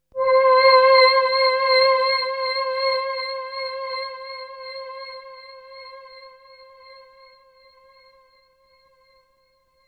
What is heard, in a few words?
Melody oneshot; ZERO G - Datafile DISC 2